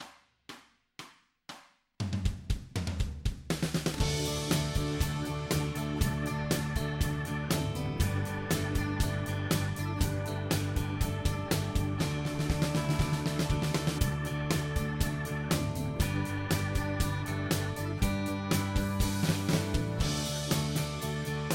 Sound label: Band; Mersey